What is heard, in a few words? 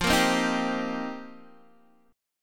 F 7th Suspended 2nd Suspended 4th, Guitar DADGAD; F7sus2sus4; strummed; x,8,8,5,6,5